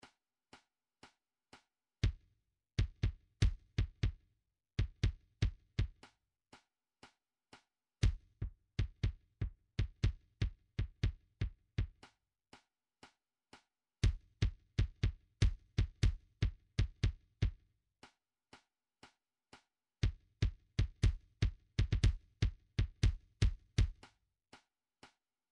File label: drum trax kick